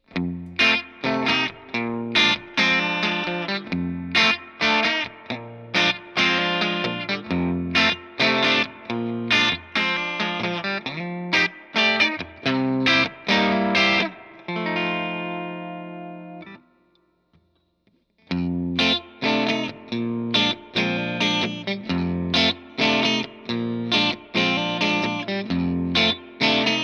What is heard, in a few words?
G&L ASAT Classic Bluesboy Semihollow; Blonde